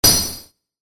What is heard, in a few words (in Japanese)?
ランク レア度1